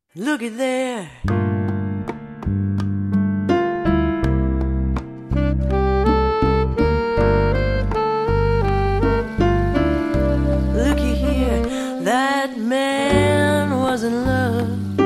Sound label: Swap Jazz